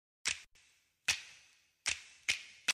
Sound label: Claps